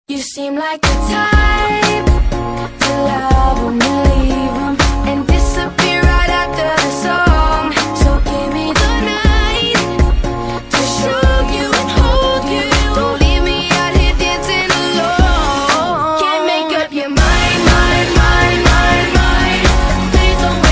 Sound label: english ringtone